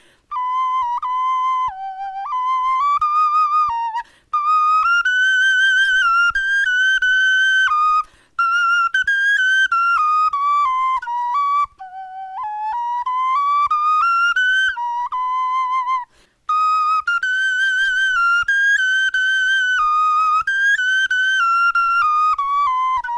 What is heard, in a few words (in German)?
melodie